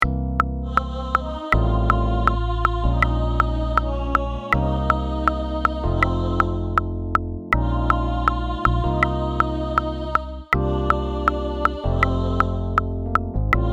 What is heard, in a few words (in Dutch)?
refrein tenor; Singalong challenge